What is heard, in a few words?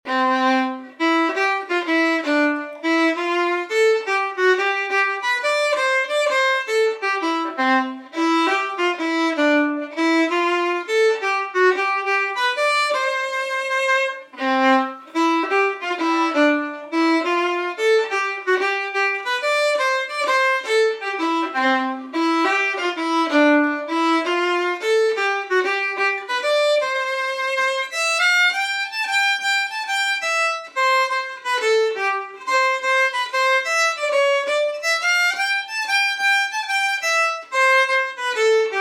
Jig; Rock Valley Jig